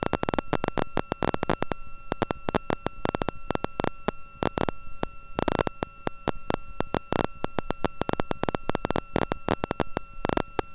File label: uranium238, geiger